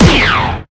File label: rebel blaster